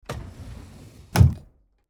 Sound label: Wooden drawer full close; household